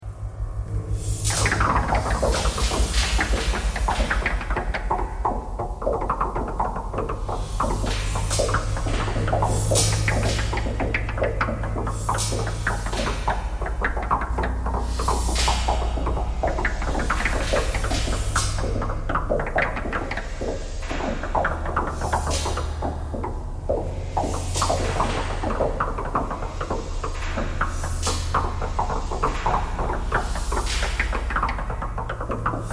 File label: knocking thread noise all